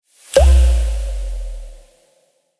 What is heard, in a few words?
pop; neode-ui